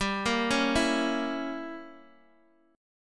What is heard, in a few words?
G Diminished 7th, Guitar, DADGAD; x,x,5,3,4,2; down